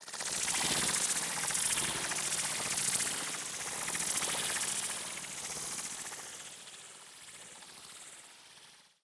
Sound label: Sword Grab Sword Dust; Chimera Ballas